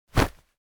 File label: Ice hockey player hockey gear puck hit movement